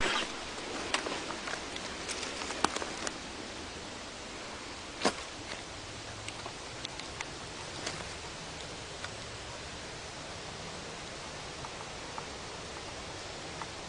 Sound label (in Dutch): ringslang